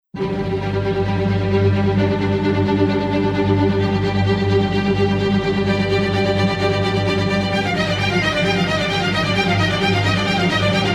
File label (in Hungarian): JINGLE ROVID; Ut igazsag es elet